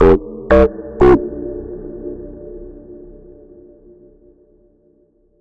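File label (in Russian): эйсид хаус в пространстве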